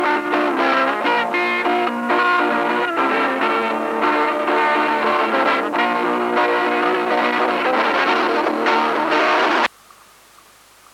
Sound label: el gitara